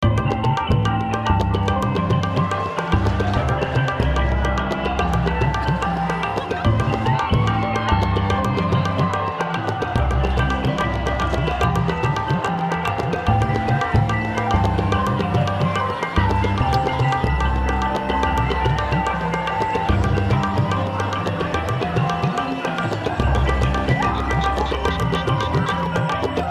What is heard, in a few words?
Cambodia Loop